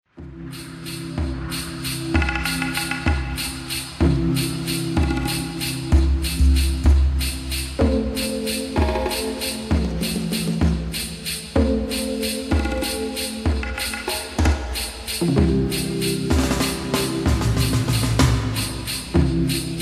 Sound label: a waltz